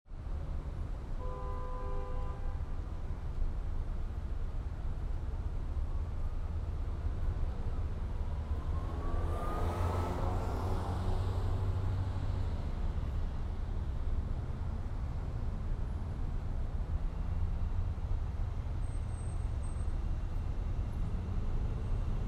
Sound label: Expressway Traffic Passes, Olofonia